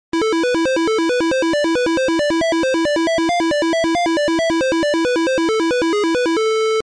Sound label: video game intro music; Paratrooper